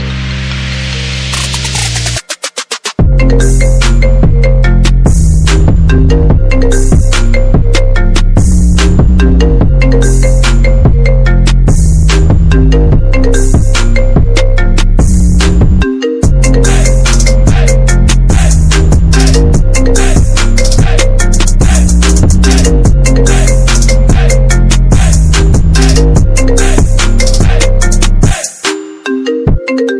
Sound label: trap remix tone; apple; ios